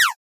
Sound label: 8bit shoot; arms